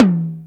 tom drum one shot e key, Royality free tom sound tuned to the E note. Loudest frequency: 474Hz